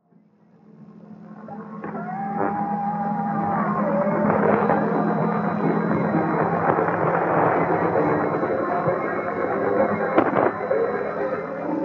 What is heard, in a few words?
mwdx 1300 khz; r iracema fortaleza